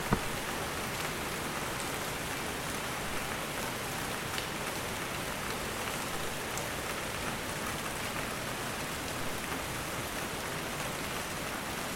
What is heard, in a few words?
Midsummer Storm